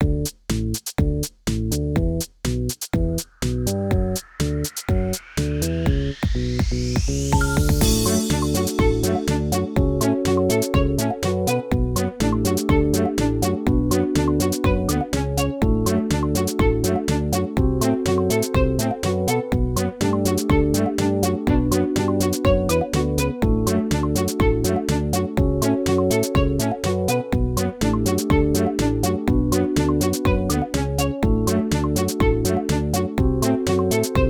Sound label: Instrumental; Doodle; Friday Night Funkin' Flip Funk OST mod Windows gamerip 2022